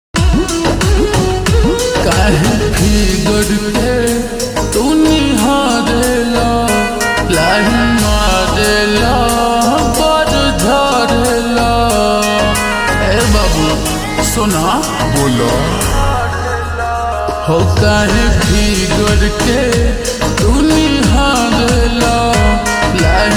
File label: Ringtone slowed reverb